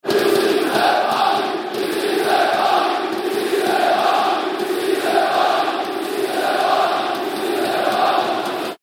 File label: Chants, Ici C'est Paris; PSG